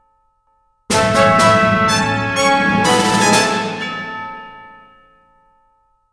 Hooray; Tomato Jones 2

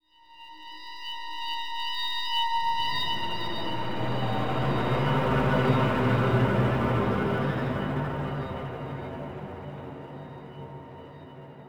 Night Amb Stinger, gamerip; dying light

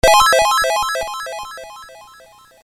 Sounds (All MCP Blocks Hit); Tron; arcade

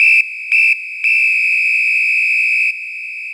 Battle - Battle Over; Mario Kart 8

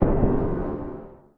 Rebloom Drum; Musician

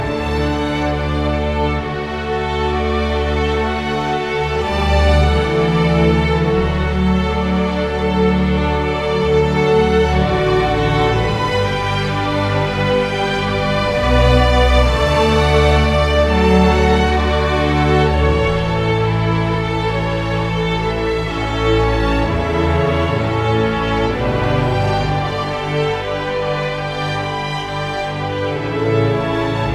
LOADING; brothers in arms earned in blood

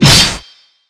Btl Sword1; Etrian Odyssey III HD

